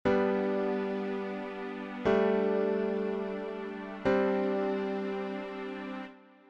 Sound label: G Sus chords